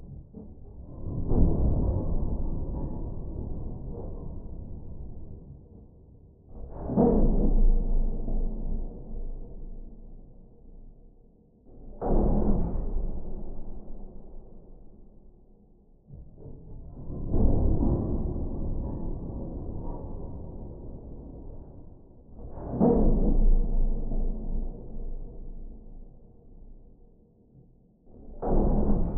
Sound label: Heavy Metal Thunks